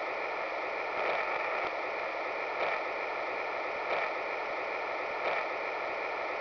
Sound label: fuel pump, noise